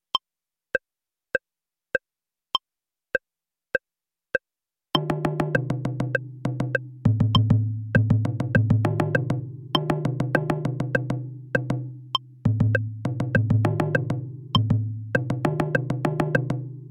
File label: hocket 100bpm, taiko